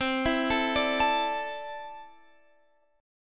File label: D 7th Suspended 2nd, chord